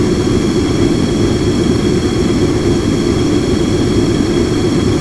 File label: Fire Loop; qubodup